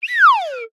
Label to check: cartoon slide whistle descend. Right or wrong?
Right